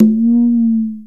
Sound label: Tumba Finger Slide; Planet Earth; Digital Sound Factory Planet Earth